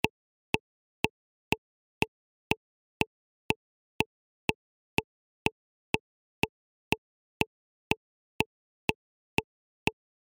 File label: Allegro